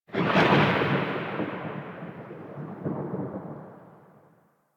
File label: Cloud Thunder; Warframe; Monkey King